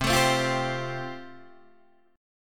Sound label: F Major Add 9th C, Guitar Standard; Fadd9/C strummed; JGuitar